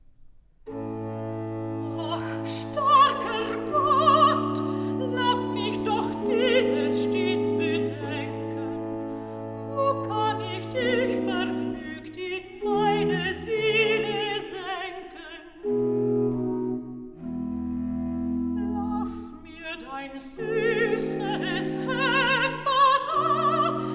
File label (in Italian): Recitativo, alto; BACH; Cantata BWV 35; Ach starker Gott lass mich